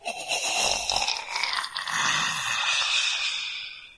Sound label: death gasp